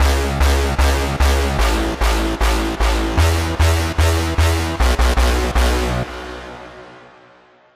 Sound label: GROWL